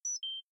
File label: bot default, robot